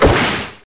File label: kick